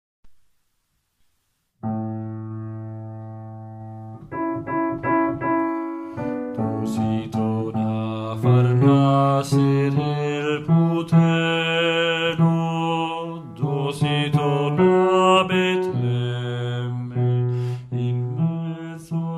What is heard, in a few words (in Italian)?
bassi